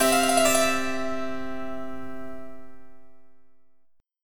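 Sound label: harpsichord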